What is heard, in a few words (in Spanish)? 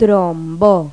TROMBON; Pipo-Aprende musica con Pipo